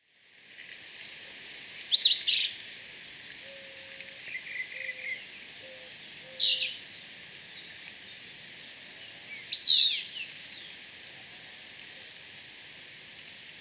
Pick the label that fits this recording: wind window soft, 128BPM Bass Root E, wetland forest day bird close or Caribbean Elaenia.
Caribbean Elaenia